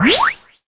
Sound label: Synth; sonic-boost